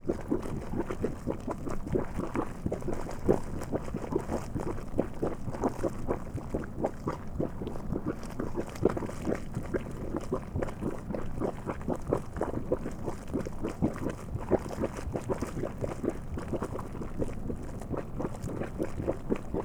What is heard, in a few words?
MUDPOT, Yellowstone Geysers; YNP; Norris; Game Audio Factory